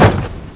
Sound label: CRASH-A2; CD Action